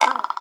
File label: idle croak, crow; critters